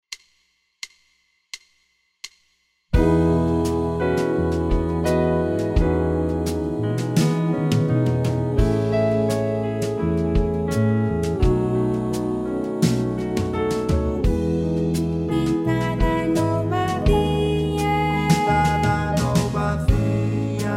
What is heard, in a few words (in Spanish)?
Cancion ultima SATB